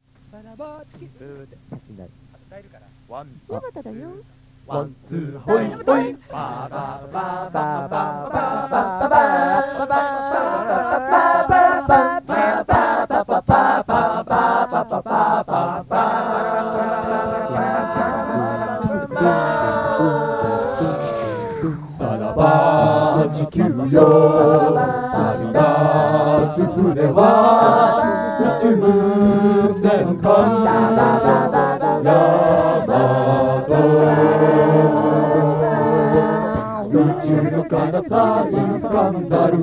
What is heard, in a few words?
Yamato acappella; これは独りじゃなく数人（酔っ払い）で合唱。自宅で。; 楽器パートを口真似するのを（今でいうビートボックス）当時「人間カラオケ」と言ってた